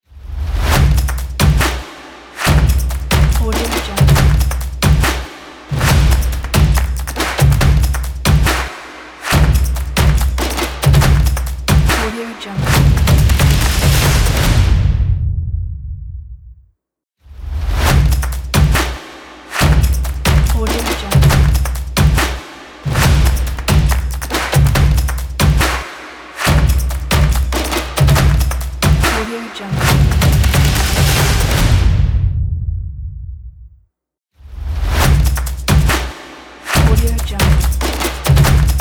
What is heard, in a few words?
percussion promo shorts; boomopera